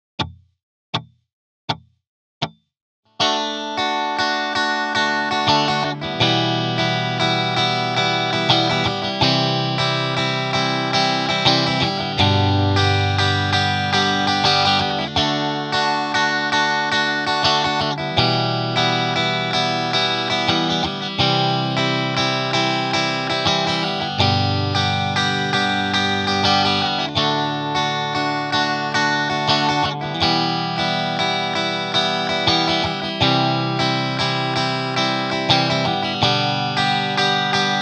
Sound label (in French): Rythmique; Les rythmiques II : Les doubles croches